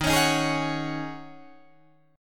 Eb Minor 11th Eb down Guitar Drop D

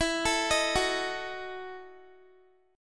E Major 7th Suspended 2nd Suspended 4th, Guitar; EM7sus2sus4 arpeggiated

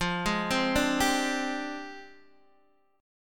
F Minor 6th Add 9th F down Guitar Open D

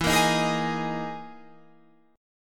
E Major 7th Flat 5th E, Guitar, Drop D; chord shape

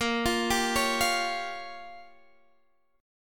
A sharp Minor 6th, down, Guitar, Standard; arpeggiated

